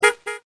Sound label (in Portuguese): BUZINA